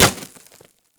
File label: Glass Bullet Impact; Ambience; Props; Warframe; Nineteen Ninety Nine